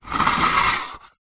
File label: foley plastic thin square panel plate scrape on wooden floor; zapsplat